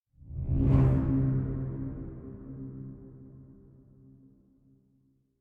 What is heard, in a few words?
epic next horn; combat